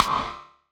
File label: rim, gated reverb; musicradar